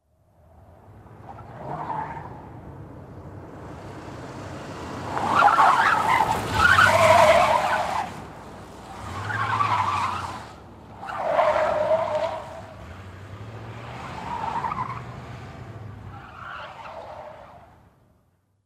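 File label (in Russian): 6 поворотов машины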